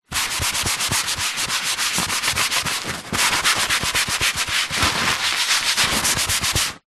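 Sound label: household paper towel tissue kitchen roll rub clean wood surface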